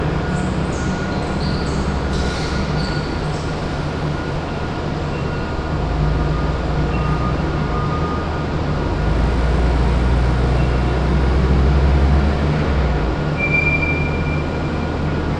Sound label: Background noise